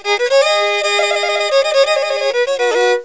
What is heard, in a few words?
lyra